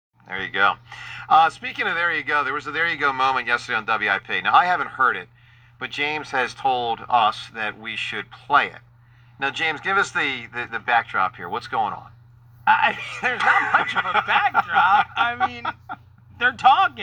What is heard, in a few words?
fart